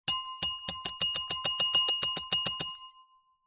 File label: bell small reception desk bell multiple impatient rings